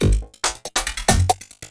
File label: LOOP, 140 BPM, Drum & Bass